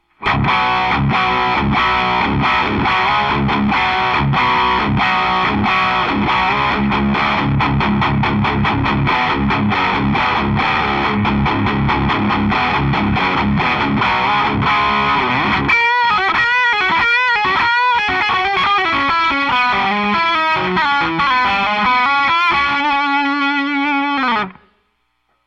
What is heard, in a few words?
rock; legend em12n; eminence